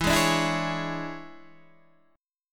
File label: E 13th, Guitar, Standard; strummed; JGuitar